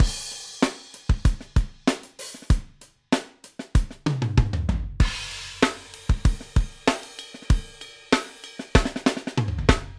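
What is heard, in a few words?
Reggae Rock; Reggea Rock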